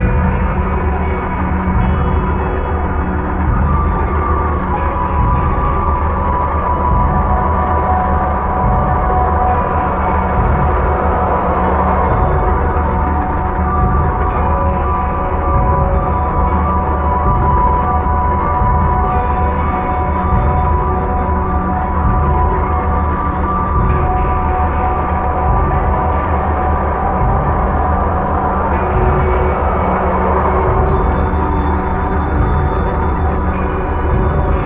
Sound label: Factory Ambient